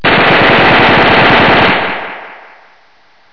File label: stuka fire